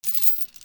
FALLING

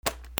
OFFICE

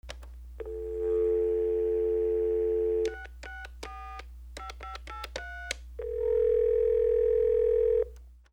PHONES

